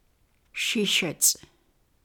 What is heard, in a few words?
bushes